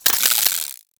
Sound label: Scaldra Pistol Alt Mech React